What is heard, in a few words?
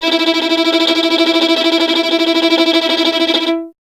STR VLN, Vln Trem; Solo Instruments; Miroslav Vitous